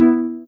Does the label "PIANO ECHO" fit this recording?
No